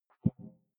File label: click big bubble damped, signale